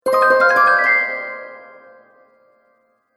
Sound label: Sparkle Gliss Bright Accent, magic sound effects